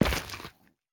菌光体踩踏声

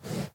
马呼吸声

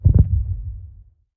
监守者心跳声

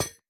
铜格栅踩踏声

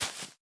孢子花踩踏声

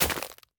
下界苗破坏声

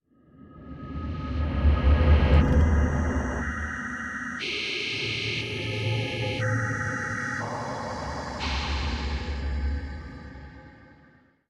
洞穴环境声; ambient

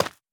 凝灰岩破坏声

